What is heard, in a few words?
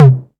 studio tom single hit c key